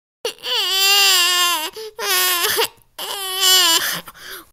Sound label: wmata 6000 series